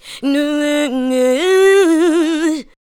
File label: ADLIBS